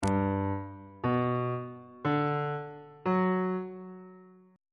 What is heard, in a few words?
d mixolydian 7th chord 4 is g major 7th on bass clef